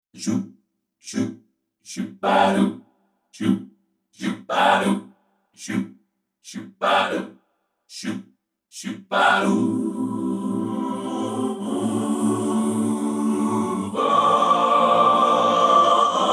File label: vp-7 classic